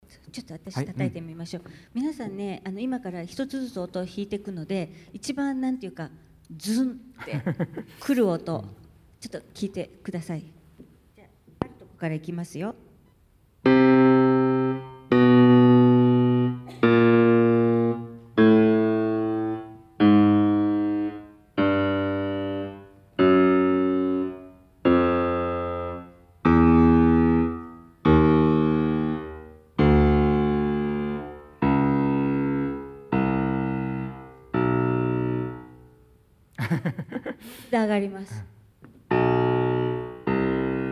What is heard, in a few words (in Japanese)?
最低音ピーク